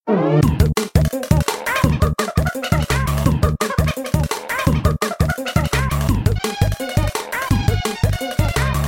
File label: stage clear diddy